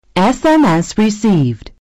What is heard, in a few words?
Message, phonetones; KS10